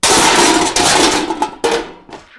Metal Debris Impact Settle

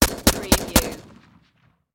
Machine Gun Sig SG5

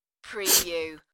sniff

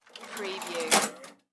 Cash Register Drawer

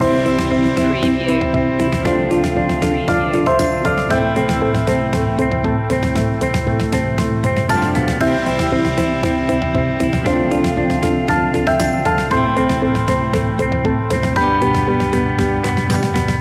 travel the distance loop

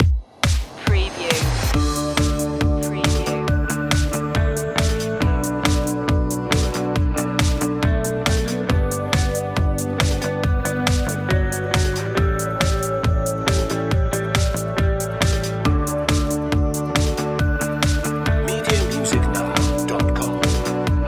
positive day

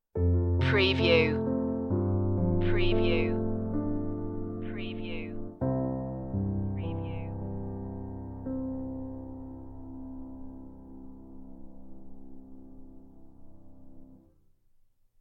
tenderness stinger

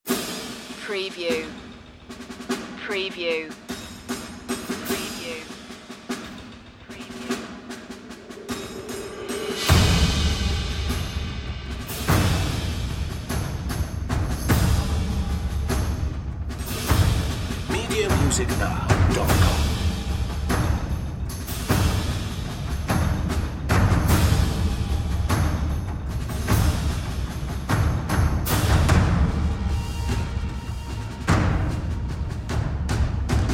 percussion

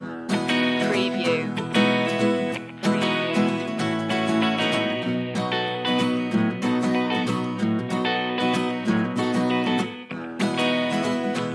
rhinestone rambler loop

